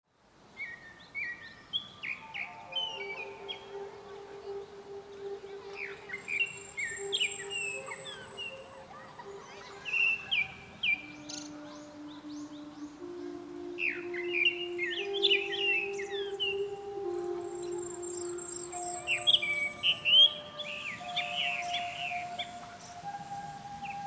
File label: twinkle flute; opera